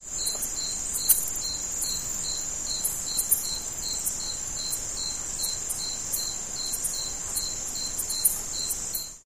Crickets Water Crackling

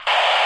radio squelch, static

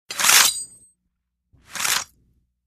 Ancient Sword Draw

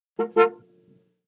Car horn, 1970's ext.

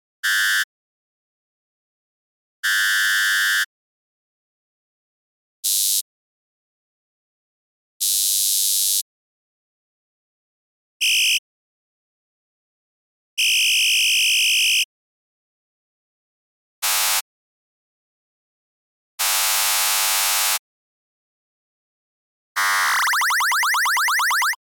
Laser 19 Short Blasts

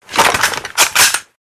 Gun Sharp Movement, handgun mvmt

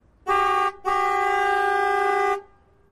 Car Horns

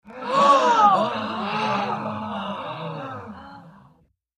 Gasp Oooh Wonder Sml

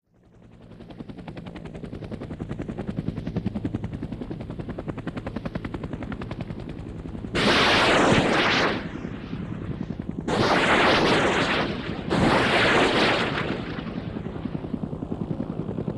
AH1 Cobra Flyby

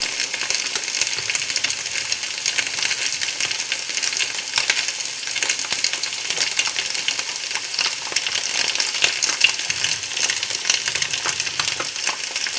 Frying Sizzle High

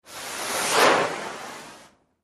Blow Torch Movement Back And Forth

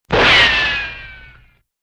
Ricochet, bullet bys & riccos

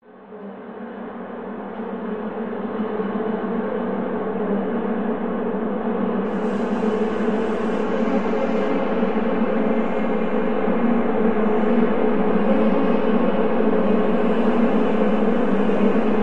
Anger Bees Swarm, Bees; alien

